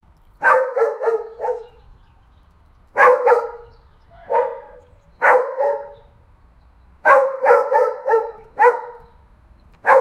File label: dog barking loudly